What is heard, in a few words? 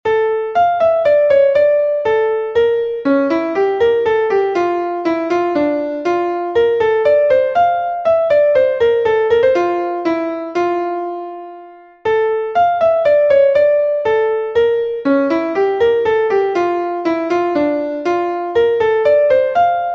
Minuet in D Minor, piano